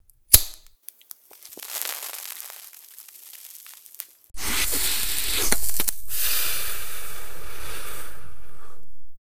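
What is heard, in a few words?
inventory cigarette; STALZONE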